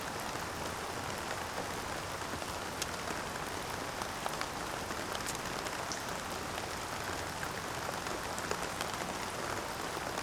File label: Gentle Rain, Sounds of Nature, White Noise